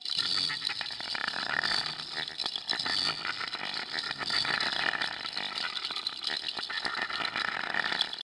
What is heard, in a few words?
bug hole, bugs; Starship Troopers